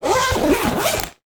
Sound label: Zipper, household rhythm samples hits